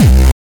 Hard kick; Bluezone

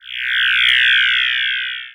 Laser Scan

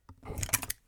Metal Slide

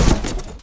Light Crate Smash

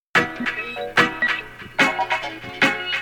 Skank

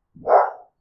Dog barking distance

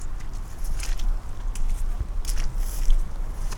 Footsteps mud

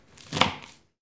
Cabbage Slice One Shot

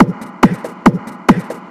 Techno Interlude

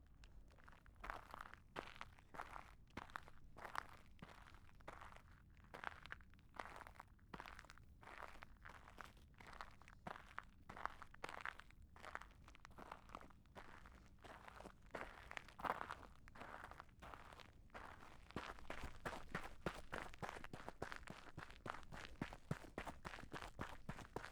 Footsteps Dirt

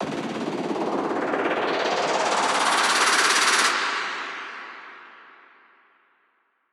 Tech Sweep

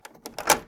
Hotel Room Door Unlocking

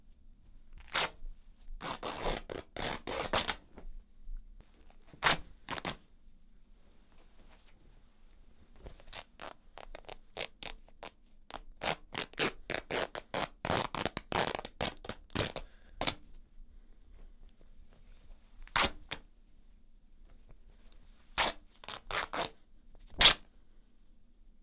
Tearing Fabric

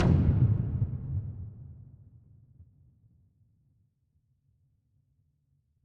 Frequency Impact

